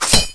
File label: knife hit wall; weapons